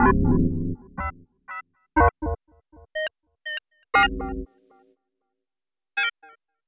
Tape Arp, Otherwordly Rhythms; Sonic Foundry (Sony Creative Software); Ma Ja Le Ethereal Textures

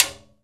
piala, timbales; E-MU Producer Series Vol. 2 – More Studio Essentials; Composer; Timbales Xswitch

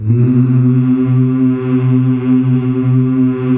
MMMMH C, male choir; Best Service; Hyper Dance

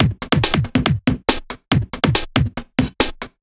Break Beats, 140 BPM

